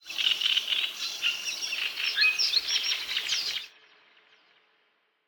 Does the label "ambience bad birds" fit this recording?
Yes